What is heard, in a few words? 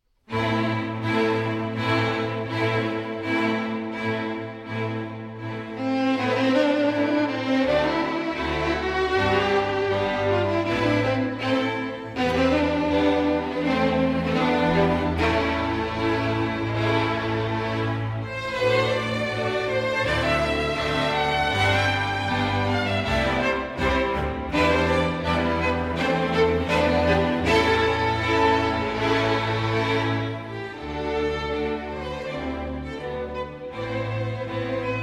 Romanian Folk Dances; BB 68, Sz. 56; Bartok; No. 1, Jocu